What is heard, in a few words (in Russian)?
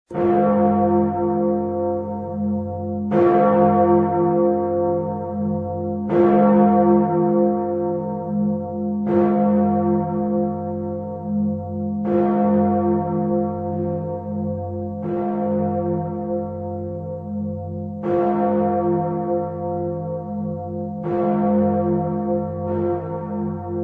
Колокольный звон; в рождественский пост